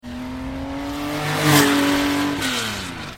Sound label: porsche 916